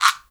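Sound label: perc afro, gourd guiro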